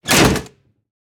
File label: altar lever pull electricity; Devour; asylum